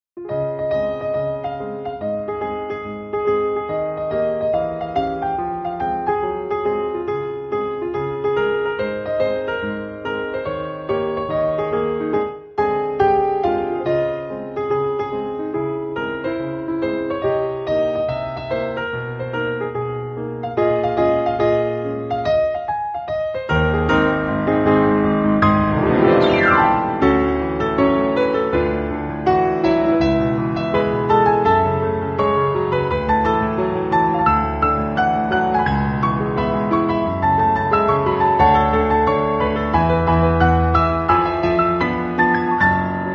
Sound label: piano ver.